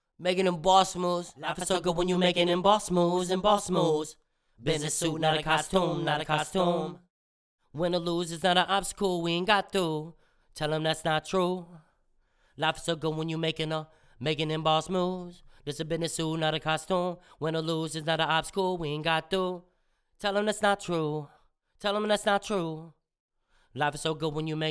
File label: HH 150 Gm